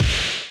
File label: punch heavy